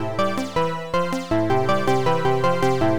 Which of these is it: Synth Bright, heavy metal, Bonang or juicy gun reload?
Synth Bright